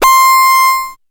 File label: syn c, Dance Synths, Acid Bubble